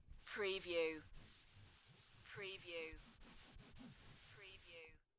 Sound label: Wiping Over a Surface; beanstalk audio